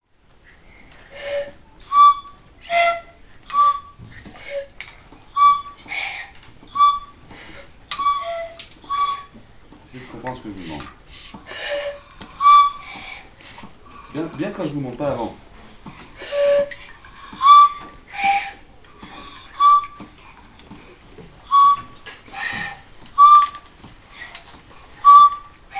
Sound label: repetition flutes; nature en musique